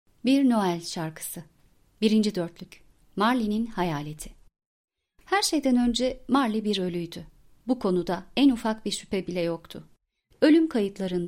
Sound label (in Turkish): bir noel sarkisi